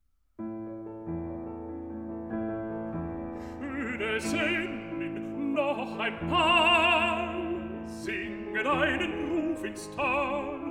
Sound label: Songs for voice & piano; Lenau; No. 4. Die Sennin; Schumann